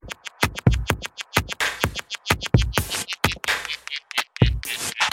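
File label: Fox Message Ringtone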